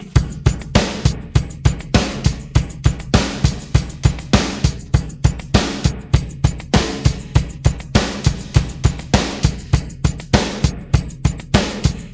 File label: pattern, drums